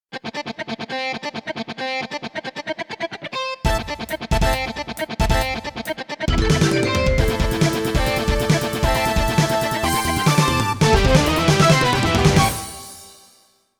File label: Forest; Virtua Racing